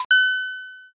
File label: register